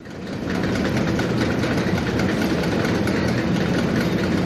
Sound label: Roller Coaster Climb